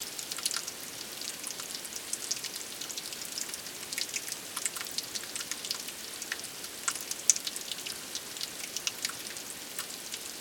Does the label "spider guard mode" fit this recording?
No